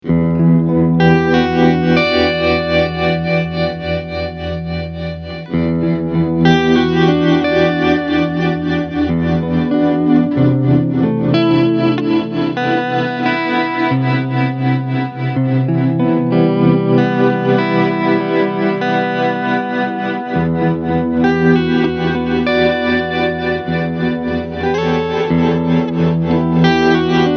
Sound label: tremolo